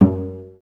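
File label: cellos pizzicato F#2; Best Service; Peter Siedlaczek's Advanced Orchestra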